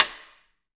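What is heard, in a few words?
snare drum, KIT Real; Real-Kit s12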